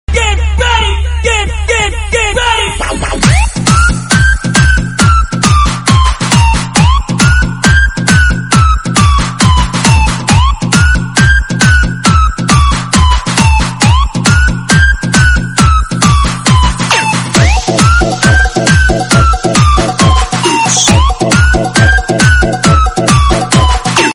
police siren trance police siren trance dj remix

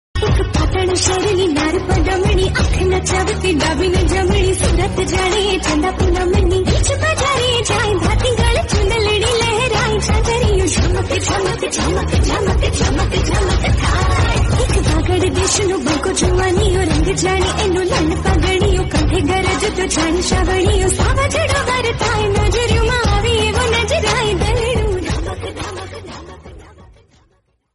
gujarati song

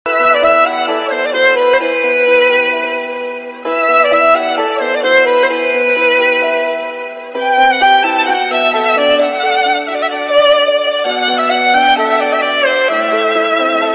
violin turkish

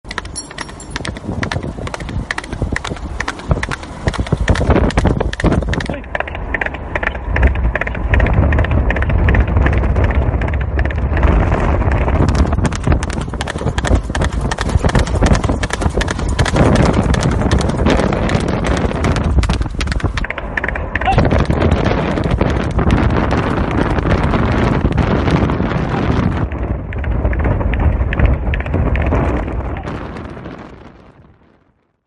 horse race sound bgm

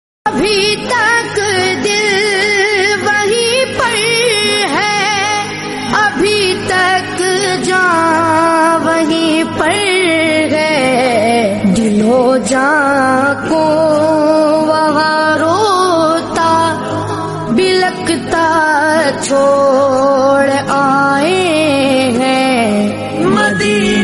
naat hindi

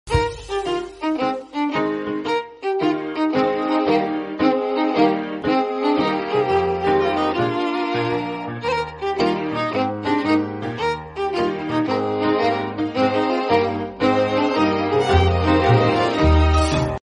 japanese bgm theme

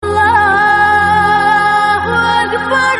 allah o akbar sms

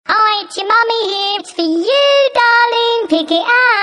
ringer

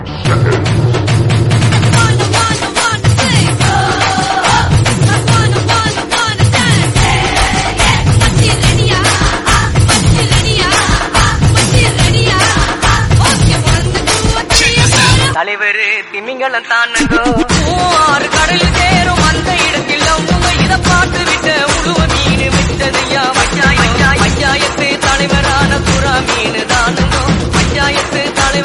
tamil remix song; killer bean

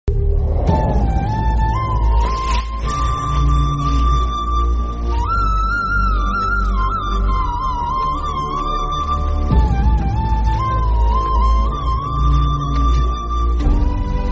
climax flute bgm; sita ramam

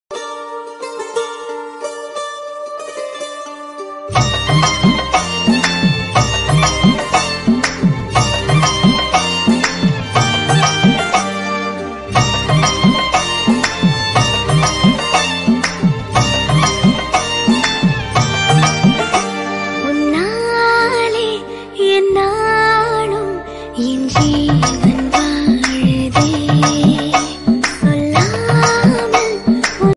bgm remix

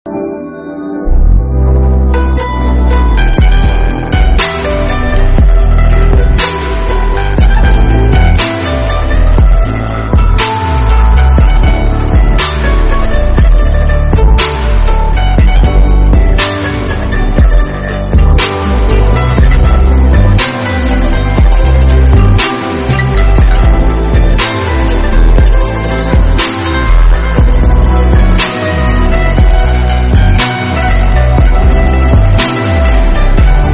instrumental sound; fitoor ost

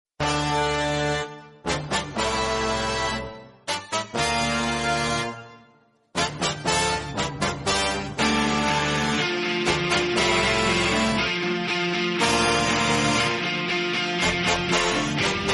bgm theme; mahaan

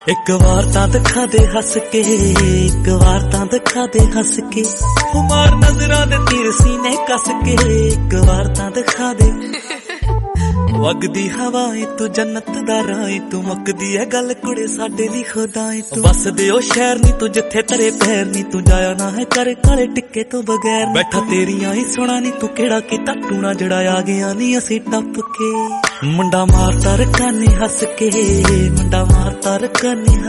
punjabi

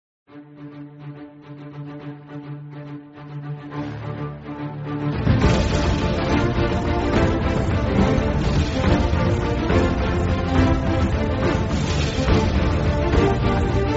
notification; pirates of the caribbean

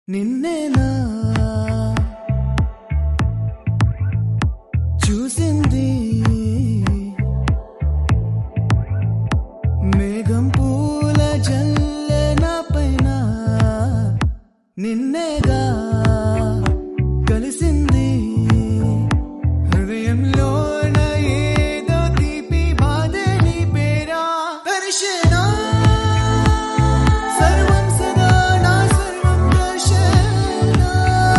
bgm malayalam; darshana rajendran

